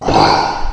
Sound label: fall; Alien Arena; xenoid